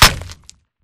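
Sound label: Bullet Light Ballistic Concrete impact; Titanfall 2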